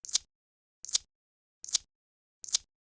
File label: coin sequence